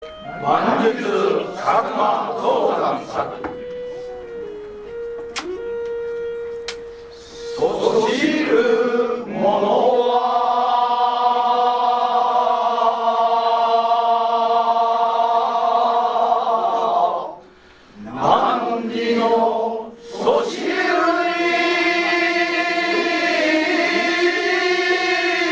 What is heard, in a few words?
manjutsu gogin men